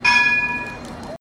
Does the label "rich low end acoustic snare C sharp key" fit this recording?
No